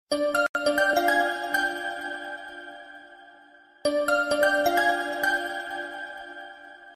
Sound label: Ringtone; Galaxy S4